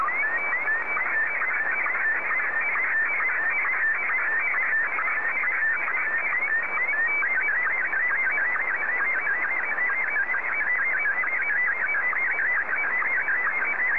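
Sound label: Martin 1, slow-scan television; JA3QJQ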